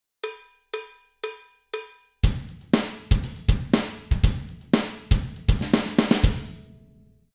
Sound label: 16 beat, drum